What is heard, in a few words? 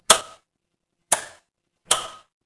mechanical switch